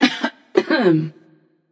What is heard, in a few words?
clear throat passion; chaac